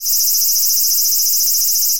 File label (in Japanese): aburazemi; insects